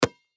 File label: Basketball Impact Catch Hands; Blastwave